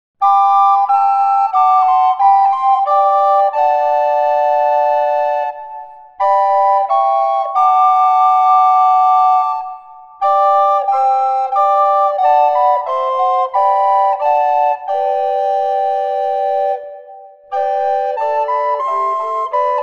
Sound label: recorder; bwv0175